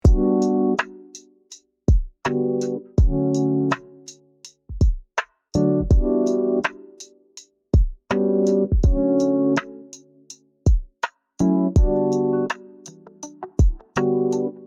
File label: Lifestyle soft percussions